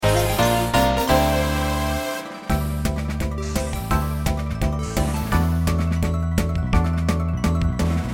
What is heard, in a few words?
Jingle Training Success; Picross